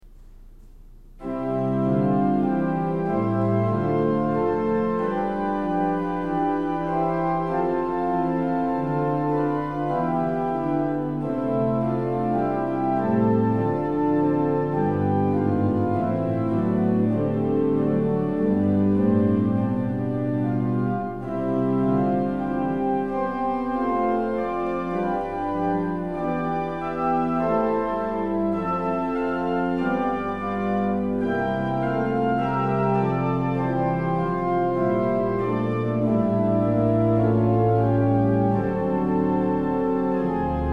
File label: Short Postlude, organ; postludes